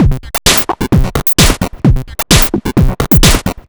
Beat Loop 130bpm 8Bit Beats; chiptune; producerloops

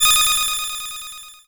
Bleepy, 8-Bit; Games And 8-Bit Kit Volume2; atomsplitteraudio; chiptune

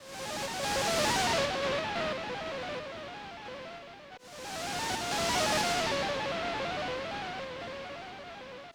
Creatures, Lo Fi Drum

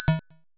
Blip, 8-Bit; Games And 8-Bit Kit Volume2; atomsplitteraudio; chiptune

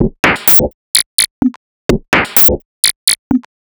Hi Perk Loop 127bpm 8Bit Beats; chiptune; producerloops

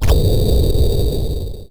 Boulder Push, 8-Bit; Games And 8-Bit Kit Volume2; atomsplitteraudio; chiptune

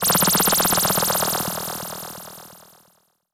Zapper, 8-Bit; Games And 8-Bit Kit Volume2; atomsplitteraudio; chiptune

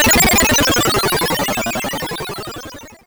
Zoom Down, 8-Bit; Games And 8-Bit Kit Volume2; atomsplitteraudio; chiptune

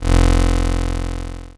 Fuzzy Bass, 8-Bit; Games And 8-Bit Kit Volume2; atomsplitteraudio; chiptune

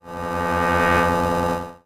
Dark Synth, 8-Bit; Games And 8-Bit Kit Volume2; atomsplitteraudio; chiptune

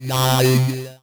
Vocalish, 8-Bit; Games And 8-Bit Kit Volume2; atomsplitteraudio; chiptune

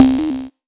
Power On, 8-Bit; Games And 8-Bit Kit Volume2; atomsplitteraudio; chiptune

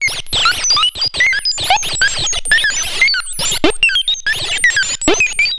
Droid Helper, 8-Bit; Games And 8-Bit Kit Volume2; atomsplitteraudio; chiptune

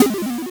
Chaos, Lo Fi Drum

